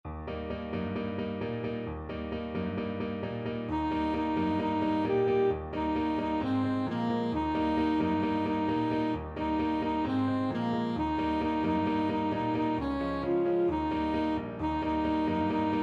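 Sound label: alto saxophone; four white horses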